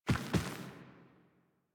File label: Foley B; Drifter Lotus End